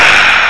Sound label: pain; quake2; shogun